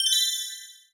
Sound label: Story Fun; Ui Story Fun2